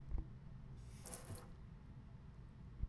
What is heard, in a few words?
water faucet